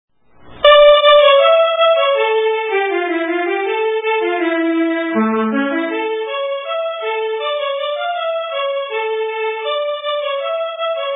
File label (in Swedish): Polska